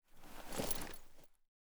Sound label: Armor On